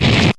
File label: Slash